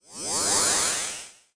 ZOOM; Gadgets; XCOM

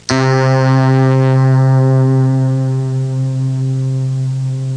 string twang; cartoon sfx; Sound Effects for Multimedia - Volume 1

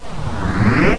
zoom in; duke nukem total meltdown

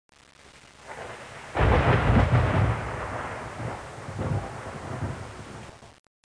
STORM; ambient; weather

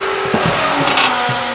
groan; XWINGTIE

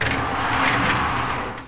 GATE MOVE; RETURN FIRE

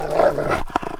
wolf; kult

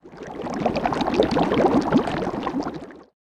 Potion Brew; kult

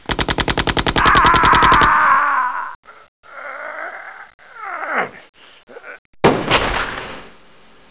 DEATH

